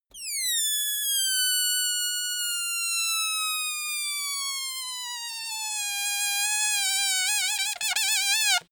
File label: balloon air release squeak long